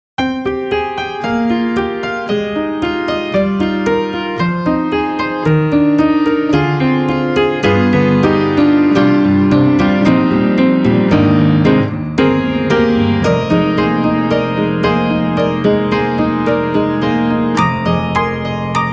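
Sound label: Upright Mono Bright, piano; Spectrasonics; Keyscape; Wing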